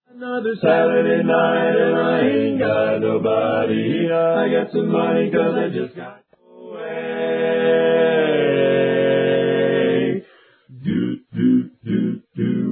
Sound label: Dance Medley